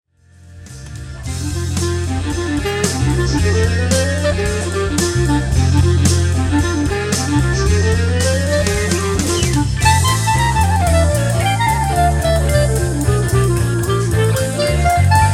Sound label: Clarinet